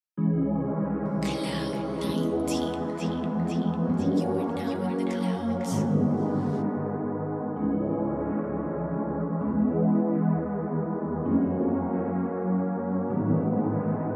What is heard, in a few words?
RnB vibe beat 130 bpm g min